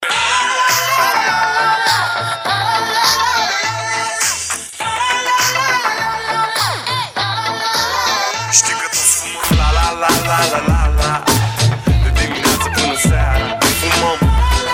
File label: remix